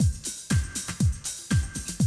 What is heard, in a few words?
Classic Drum Loops